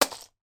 game plastic houses and hotels in cloth pouch drop on playing board; monopoly